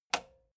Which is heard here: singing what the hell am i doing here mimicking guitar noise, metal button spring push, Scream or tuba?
metal button spring push